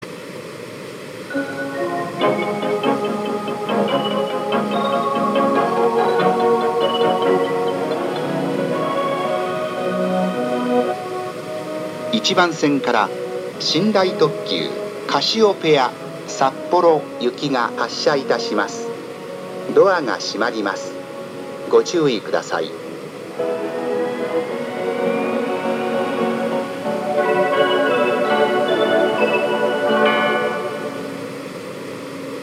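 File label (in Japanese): 1番線発車メロディー, 仙台駅; 寝台特急カシオペア号札幌行き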